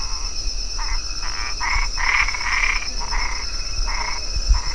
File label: Hypsiboas albopunctatus